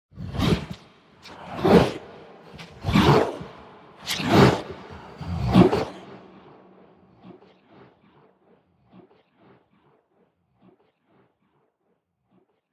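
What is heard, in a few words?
Halloween horror sound devouring monster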